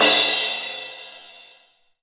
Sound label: Crash Cymbal